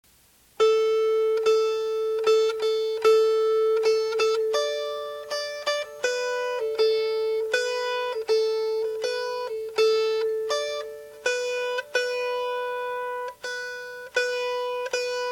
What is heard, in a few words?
Sorghum Syrup Melody